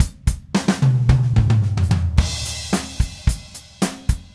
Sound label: Red Maple Groove 110, DW Red Maple Kit